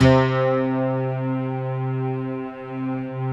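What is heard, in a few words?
bright guitar strings; Optical Media International - Sonic Images Library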